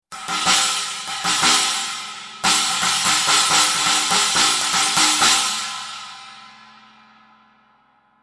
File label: sabian 16 aax chinese